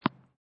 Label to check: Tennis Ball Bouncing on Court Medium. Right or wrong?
Right